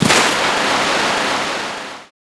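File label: water in; openffa; sonicnew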